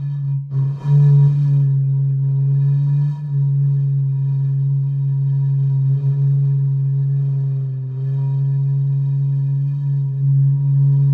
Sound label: Whistle Stone C sharp Loop; Tejava; Journey